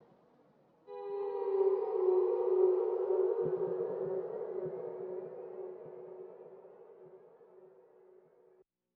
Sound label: Guitar; Hip Hop; Apple Loops; Big Fish Audio